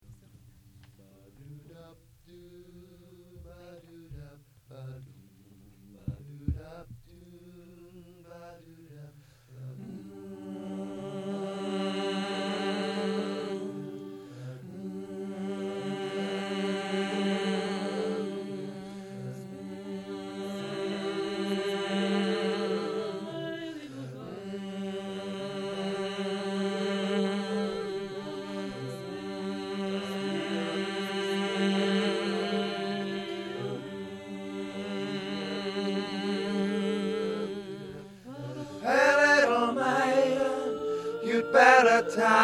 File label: Spin Tenor; training recordings